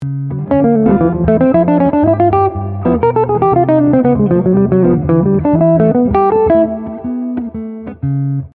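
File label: Lick, Jazz Fusion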